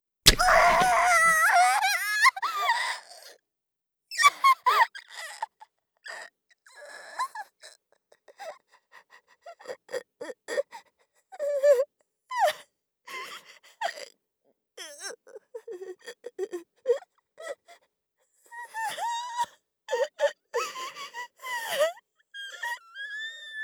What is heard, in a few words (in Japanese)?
二の腕に刺す, 効果音あり